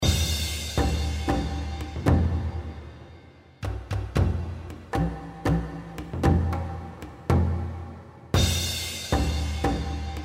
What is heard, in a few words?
Drums